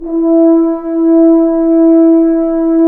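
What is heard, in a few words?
BRS F.HRNS, French Horns Ambient; Roland L-CDX-03 Disk 2; F.Horns 1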